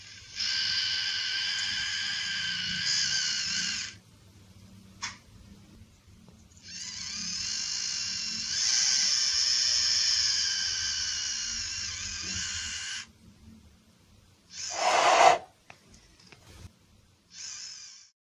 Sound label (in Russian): salamandry